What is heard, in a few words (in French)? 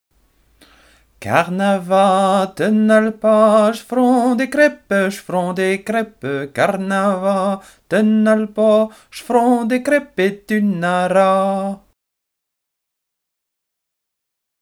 Voix